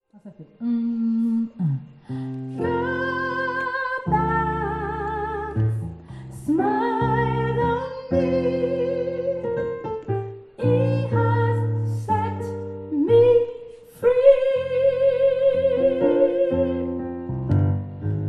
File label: SOPRANO